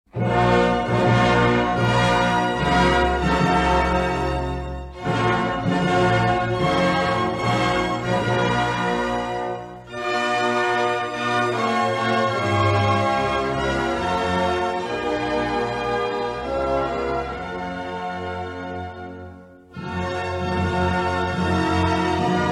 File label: hymna switzerland